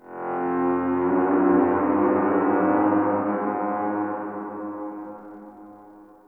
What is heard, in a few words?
Venus Landscape Distant Horn High, Ambience; Warframe; Venus Open World